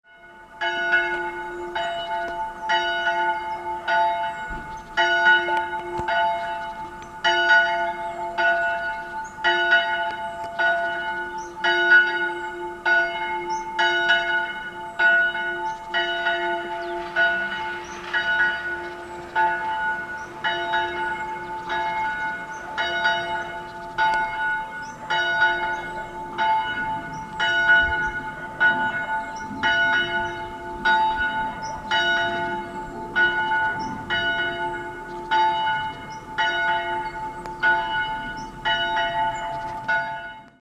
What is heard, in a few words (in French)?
Cloche chapelle